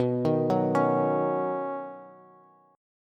B Major Add 9th B Bass; Badd9; arpeggiated; JGuitar

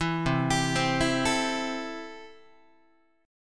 C 6th C down Guitar; C6 arpeggiated; 7,8,10,10,8,8

